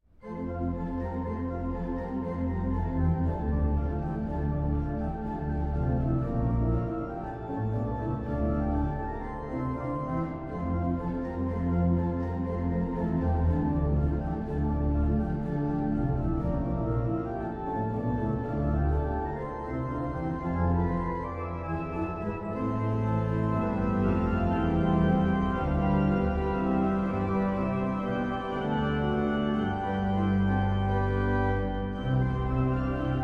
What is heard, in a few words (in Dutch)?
Toccatine en Variaties (hoofdorgel); Psalm 116